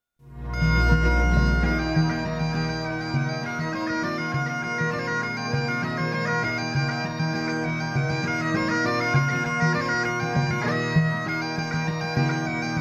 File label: binary